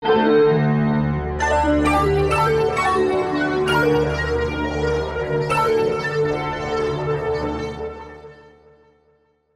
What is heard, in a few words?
DRAIN PAD; eShop